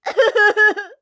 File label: voice Cry